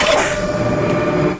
big pump; hydrophobia

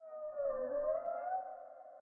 Dino Distant; docks

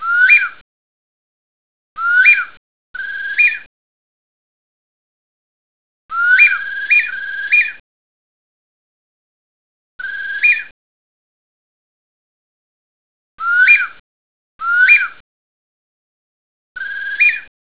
bird

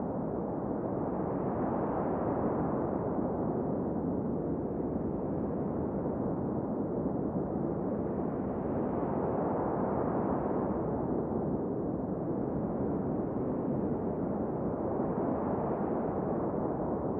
wind outside cold

